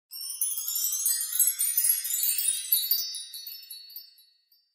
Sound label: chimes; priestess